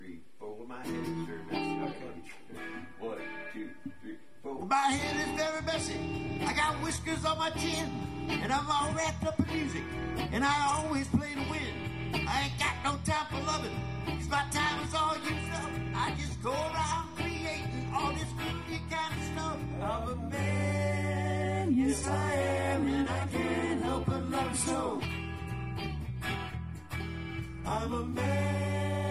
synched backup vocals and harmonies; vocal practice

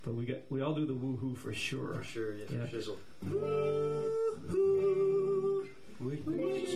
OOOooo

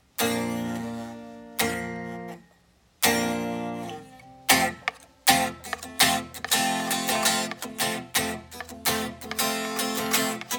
rythym guitar only

